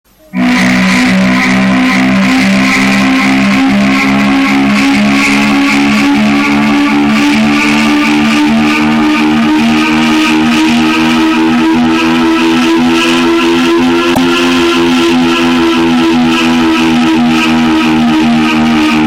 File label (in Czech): auto zvuk toyota